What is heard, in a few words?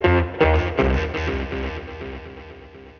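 electric guitar, ethno, 160; eJay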